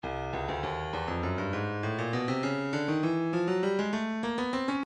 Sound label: breakbeat midi 100 bpm; obliged d; Stylus Breakbeat Midi Files